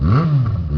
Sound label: acceleration G minor